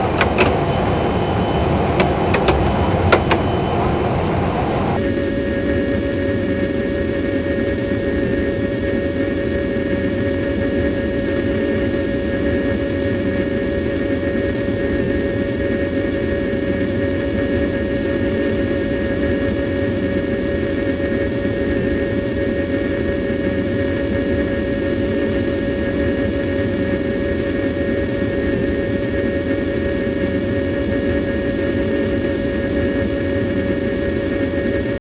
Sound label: flaps, B747; Aircraft; FS9; PF2K